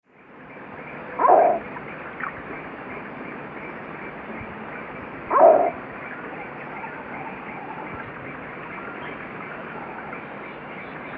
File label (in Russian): kvakva golos